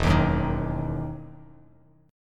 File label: E Major 7th Suspended 2nd E down Bass Standard; EM7sus2 strummed